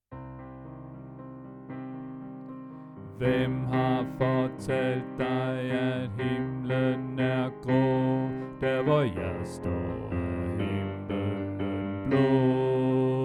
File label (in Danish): Bas; oevefiler